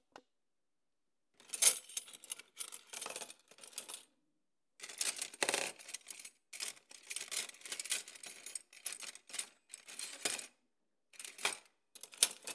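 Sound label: Sonidos De Una Cocina Sounds Of A Kitchen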